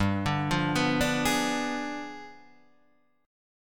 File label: G 7th Flat 9th, Guitar, Standard